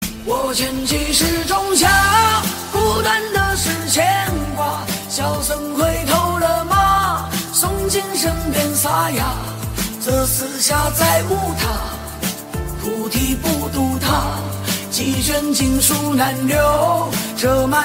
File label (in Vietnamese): tieng trung nhac hoa